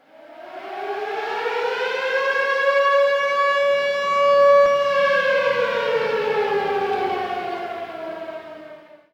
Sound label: airraid